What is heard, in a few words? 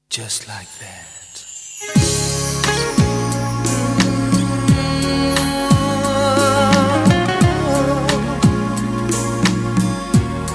rumba